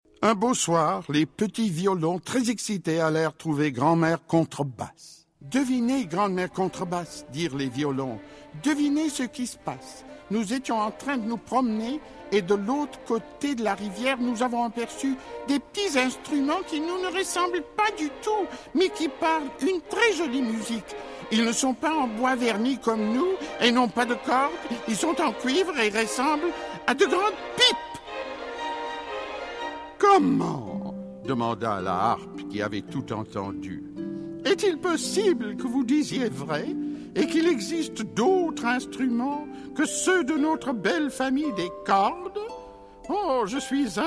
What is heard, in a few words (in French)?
intro saxo; piccolo saxo